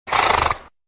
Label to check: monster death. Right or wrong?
Wrong